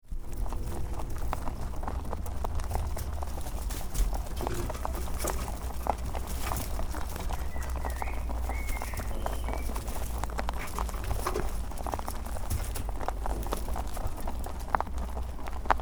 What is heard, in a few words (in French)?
repas lapin; La mastication des lapins.